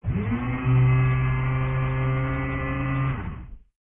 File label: Mech Motor Fidgt; Sid Meier's Civilization 4 Complete; Beyond the Sword; Units